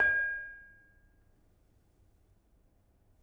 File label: Bonang Panerus, gamelan; gravityharps; timbrebox